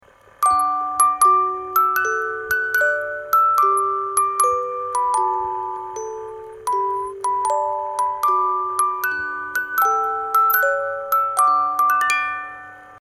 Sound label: movement, railways